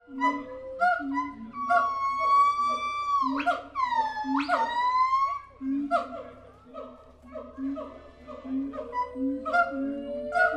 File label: gibbon calls at twycross zoo